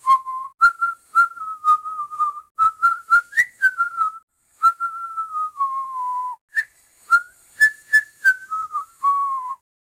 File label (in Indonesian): suara siulan nuansa reggae